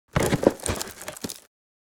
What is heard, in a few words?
Wicker basket lid rustle and close